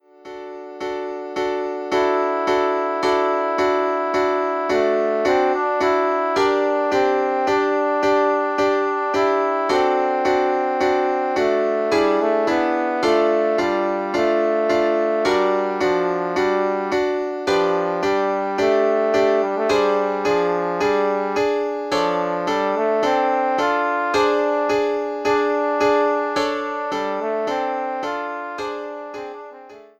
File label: Trombone and Piano; Andante